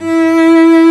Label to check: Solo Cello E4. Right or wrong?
Right